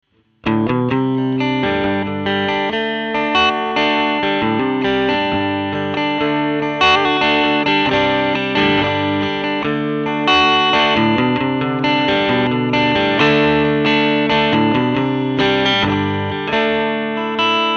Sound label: strat rockwood